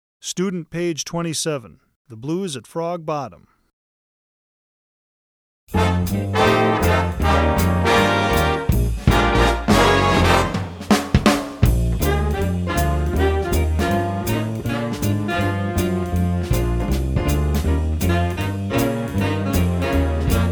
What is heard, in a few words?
Blues